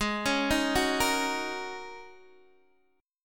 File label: G sharp 9th Suspended 4th G sharp Guitar DADGAD; G#9sus4; x,11,11,8,9,8; arpeggiated